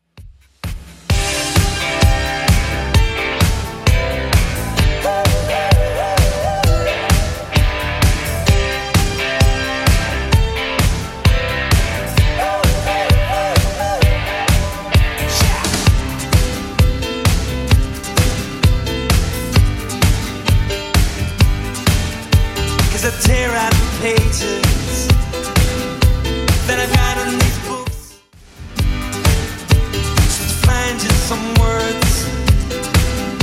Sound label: 80s Redrum 130bpm